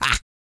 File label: GRUNT, MALE HUMAN; ILIO; Vocal Planet